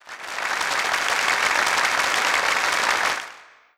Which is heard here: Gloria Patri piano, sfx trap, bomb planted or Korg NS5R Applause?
Korg NS5R Applause